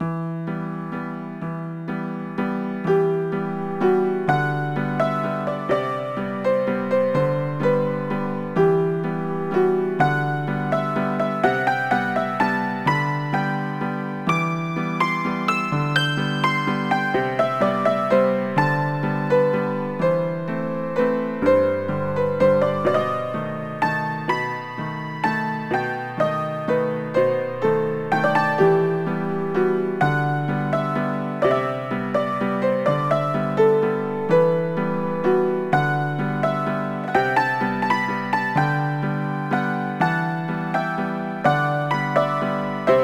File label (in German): Dance Walzer Medium; knigge spielend zum guten benehmen; nds